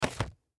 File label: Magazine Set Down; Objects; Foley; Blastwave